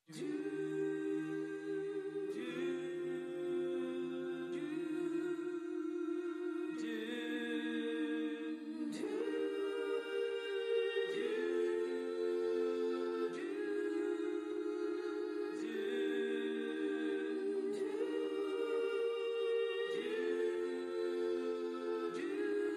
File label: Voices Of Soul Clean